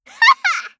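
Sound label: attack, Voice Line, Korean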